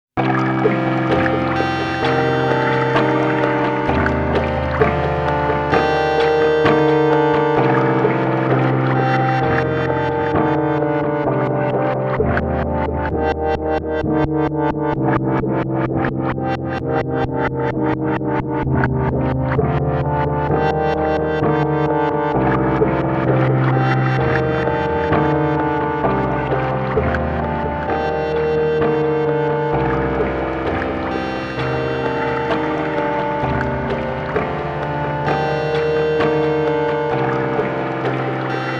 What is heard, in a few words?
Sequential Prophet X